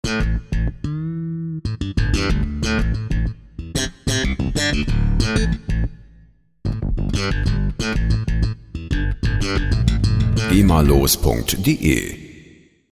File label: slap bass